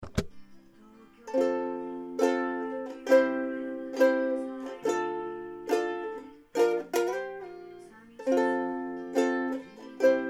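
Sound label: ukulele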